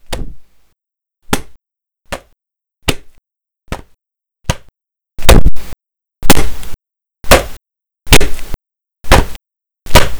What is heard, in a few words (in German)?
Schlag